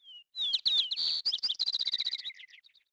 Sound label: BIRDMIX, residential; zones